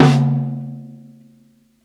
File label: 60s TOM SOFT, DRUMS ONE SHOTS; BASEMENT DRUMS VOL 2